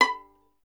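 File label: STR violin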